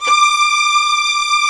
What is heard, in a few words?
STR SW VLN, Vlns 8 fff, Agitato; Roland L-CD702